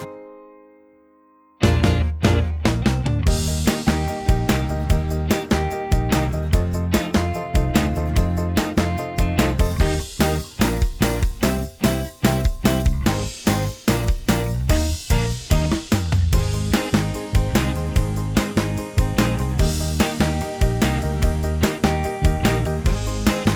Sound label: Teddy Bear Dance Karaoke